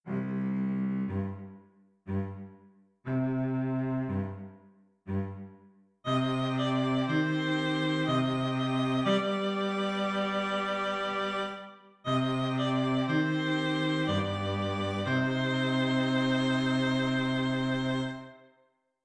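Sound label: String Quartet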